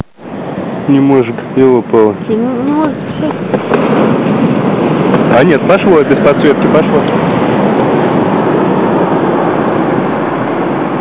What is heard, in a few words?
ocean full